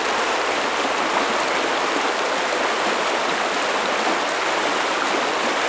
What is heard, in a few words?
stream; Mafie 1